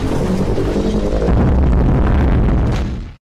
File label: death screech; titan